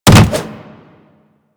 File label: Jump Attack Default; Footsteps; Warframe; Tenno; Wall Run